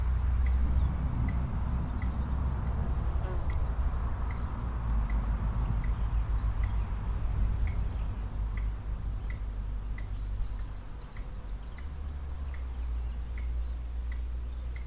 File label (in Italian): Scoiattolo rosso; xenocanto; the squirrel